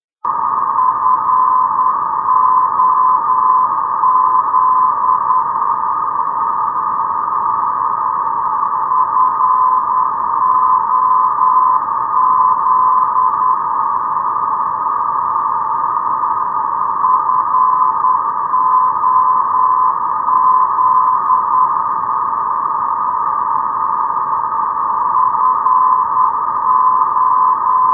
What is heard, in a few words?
foz do iguacu 410khz